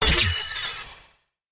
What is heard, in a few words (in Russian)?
vystrel magicheskoi palki trrt